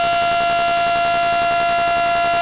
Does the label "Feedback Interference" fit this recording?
Yes